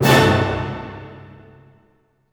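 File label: C diminished fortissimo, orchestra hits; Sound On Sound - Peter Siedleczek's Orchestra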